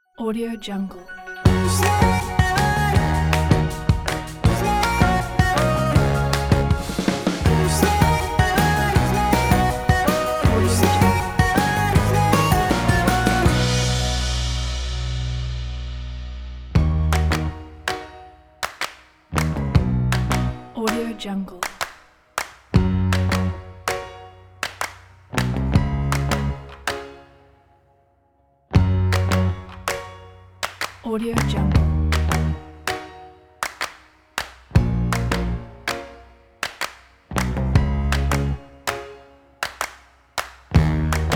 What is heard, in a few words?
upbeat retro pop